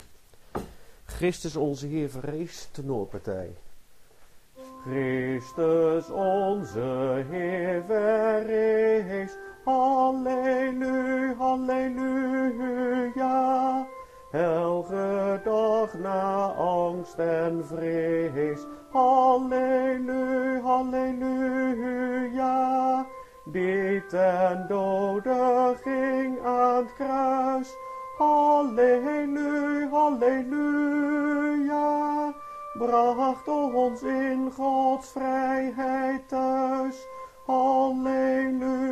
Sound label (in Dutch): Christus onze Heer verrees tenor